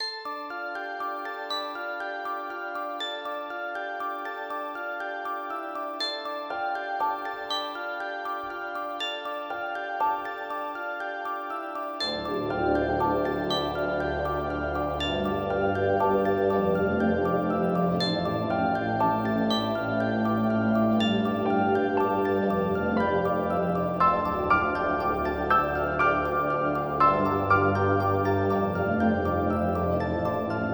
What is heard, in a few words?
Loop; Try and Solve This Loop